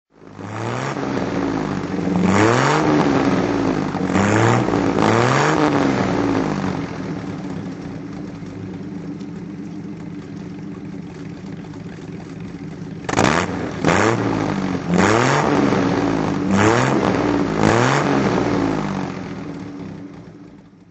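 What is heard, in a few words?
bentley special throttle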